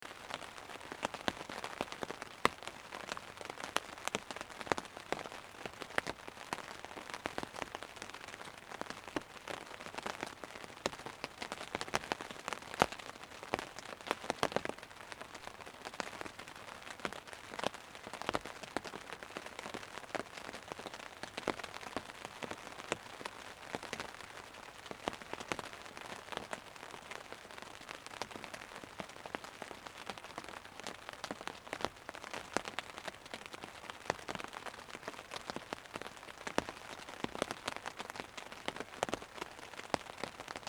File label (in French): Pluie Sous Tente